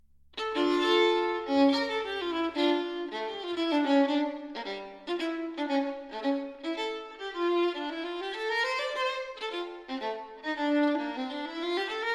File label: violin partita in d minor corrente; violin partita no. 2; bwv 1004 ii